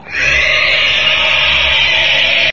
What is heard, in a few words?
rat warcry, rat screech